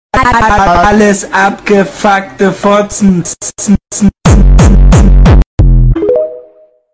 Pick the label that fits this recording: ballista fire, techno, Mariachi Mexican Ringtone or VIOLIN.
techno